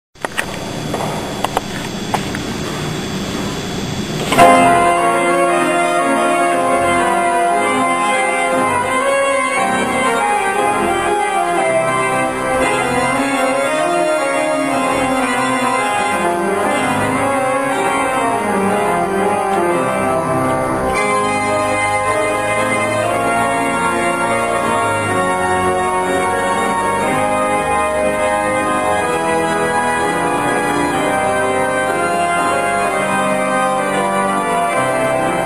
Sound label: organ prelude; psalm 72